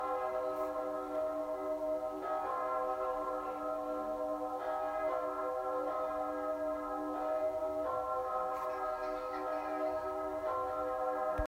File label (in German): Glockenklang Petri